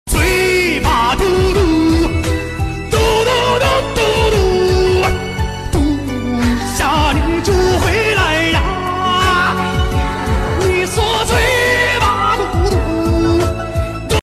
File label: singing that one chinese wood song; chuddy wuddy